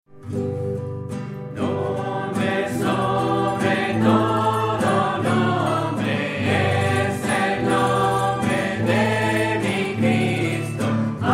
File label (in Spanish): guitarra